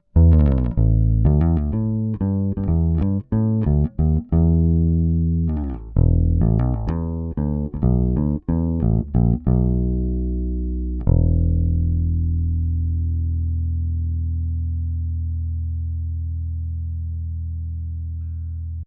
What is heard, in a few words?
RME UFX Bass DI